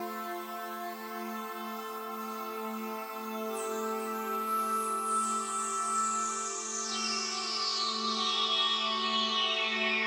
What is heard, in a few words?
SPACE PAD 140 A; SpaceSounds